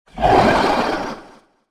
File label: roar; Dracolich